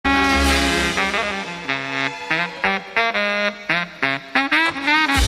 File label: solo